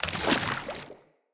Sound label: Wolf Steps Land Water, Jump; Animals